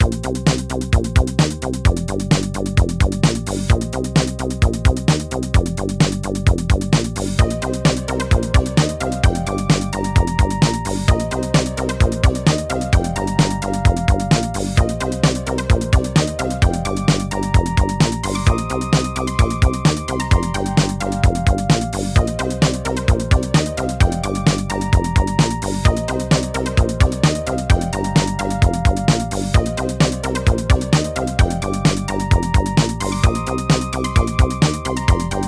roland tb-303 bass line

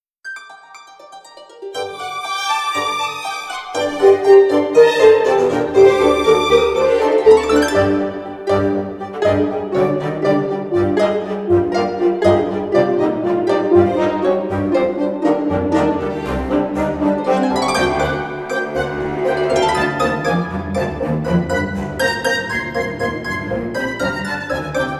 harp in action dressed

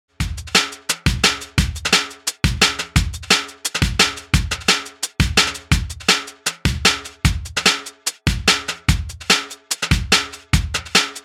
future break

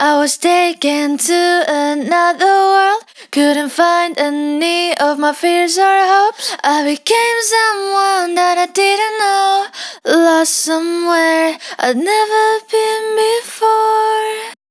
vocal female before

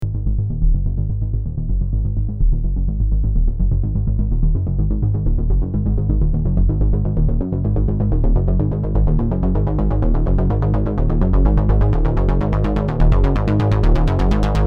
jbass

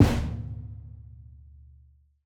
lil space stomp; nova sound

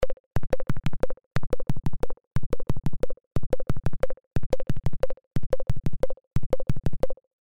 bombo leguero cadence

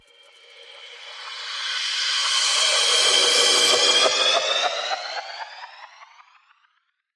club risers

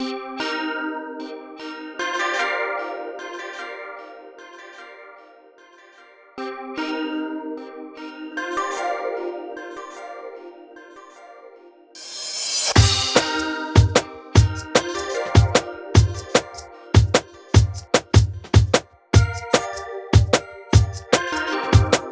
small dnb loop

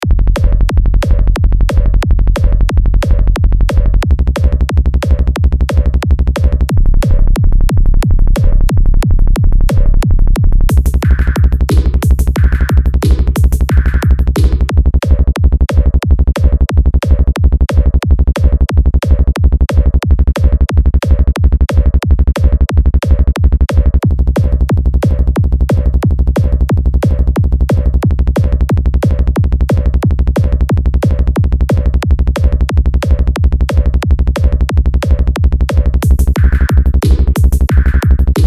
hitech 180 bpm; eplex hitech

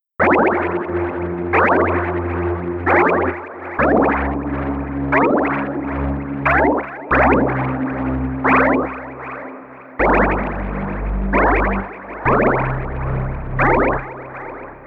deep bubble

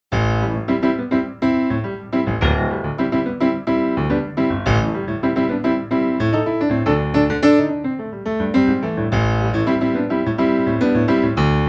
funk

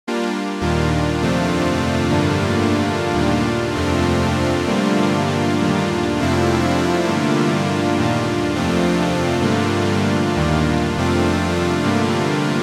pure broad lush; stringer

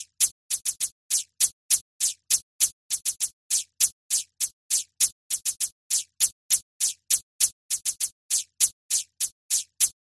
afro-latin american cabasa

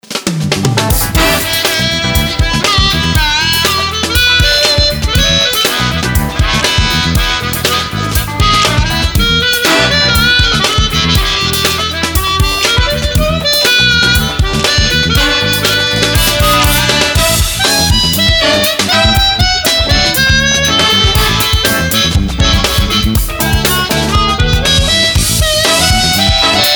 dirty harmonica

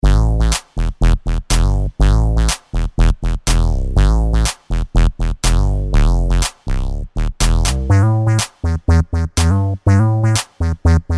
wired basses

